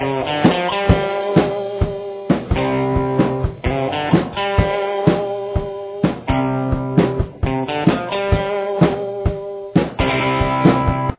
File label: intro guitar